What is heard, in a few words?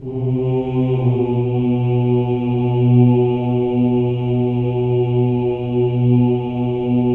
Voices; Spectrasonics; Symphony of Voices; HALF DOWN